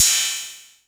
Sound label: Bass Crash, Jomox Airbase99, Drum Machines